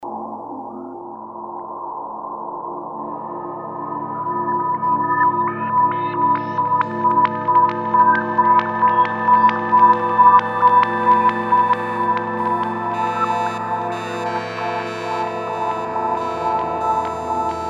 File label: pad etheral void, roland jd800; PAD ethereal voice